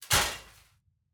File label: Metal Footfall; soundscrate